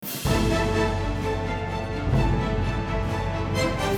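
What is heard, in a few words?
Music Boss Theme Bpm128 Start; asterix obelix slap them all 2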